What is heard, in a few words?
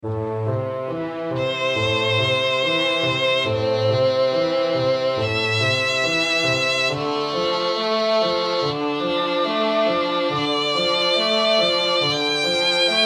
Italian Fiddles